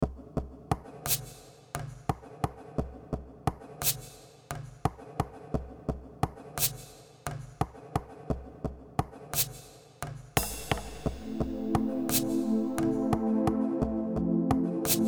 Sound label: instrumental; Nemes Nagy Agnes